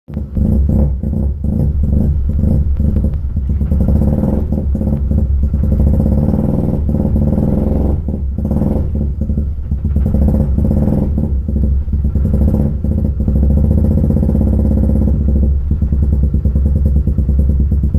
engine sounds different after thermostat housing replacement; 2nd generation 1986-1992